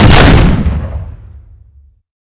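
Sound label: explosion flash bang; Modern Warfare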